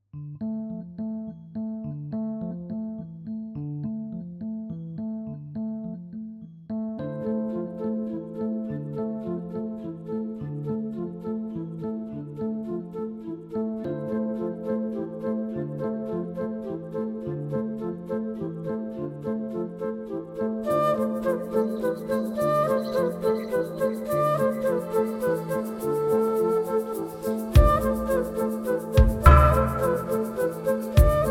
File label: Dancing Flutes